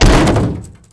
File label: bodyfall; Mafie 1